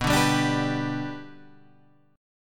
B Minor Sharp 5th; Bm#5 Chord {7 5 5 7 8 7} strummed

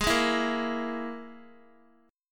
G sharp Suspended 2nd Sharp 5th, Guitar; G#sus2#5 strummed; x,11,8,9,10,x

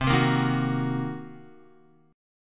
C Minor 7th Flat 5th, Guitar; strummed; 4F,4A,5C,5G,5C,6E; JGuitar

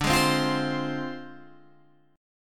D Minor 7th Flat 5th Guitar Open G

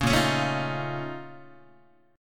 A sharp Minor Major 11th, Guitar; strummed; 4,6,4,7,7,4

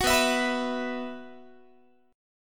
B Suspended 4th, Guitar; Bsus4; strummed; 21,19,19,21,20,x

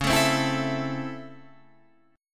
C sharp Minor Major 7th Sharp 5th C sharp, chord

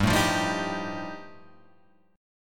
G 7th Suspended 2nd Sharp 5th G chord

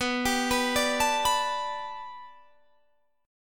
B-Minor 7th Sharp 5th B down; Bm7#5 arpeggiated

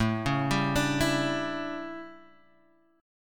A 11th down Guitar Open F, chordshape; arpeggiated

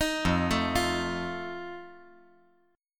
F 7th F down

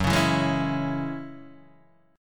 Gb 7th Suspended 2nd Gb Guitar Open D

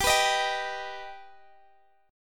G Augmented G Guitar Standard; strummed; capo 9

